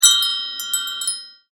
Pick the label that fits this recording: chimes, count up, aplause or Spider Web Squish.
chimes